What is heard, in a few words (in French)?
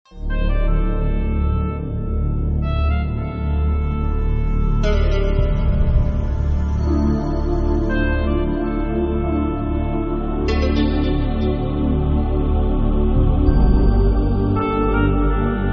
Generique orchestral